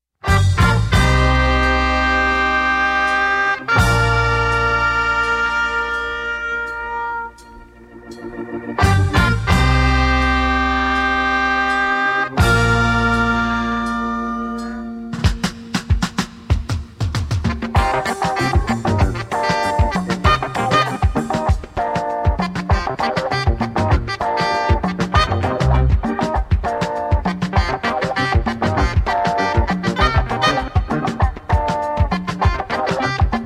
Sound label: AFRO FUNK